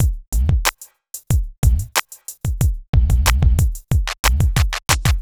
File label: r and b